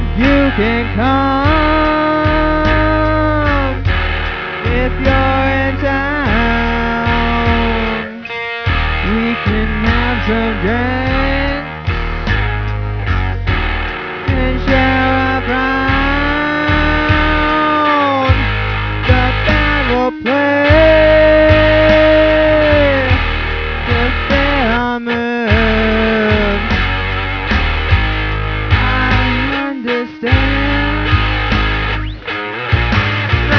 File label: jazz blues